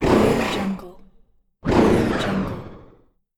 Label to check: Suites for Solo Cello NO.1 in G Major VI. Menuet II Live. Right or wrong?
Wrong